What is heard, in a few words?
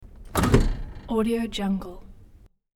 truck pedal stomp; hollywoodedge